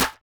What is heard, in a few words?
snap; foxdot default